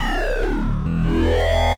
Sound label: warp